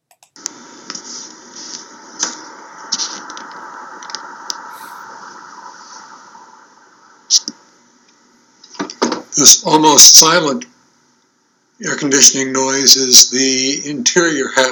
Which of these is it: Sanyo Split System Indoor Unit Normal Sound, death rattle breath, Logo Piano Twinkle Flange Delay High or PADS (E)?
Sanyo Split System Indoor Unit Normal Sound